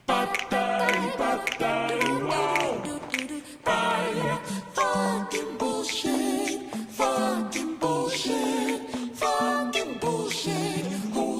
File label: song; taunts; hunters